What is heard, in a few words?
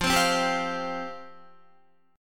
F Suspended 2nd F Guitar; Fsus2; strummed; 12,15,12,12,12,13